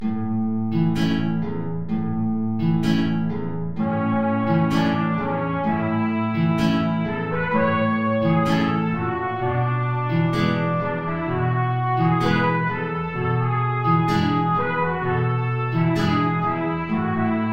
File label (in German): Tango, Trompete in Bb & Gitarre, tief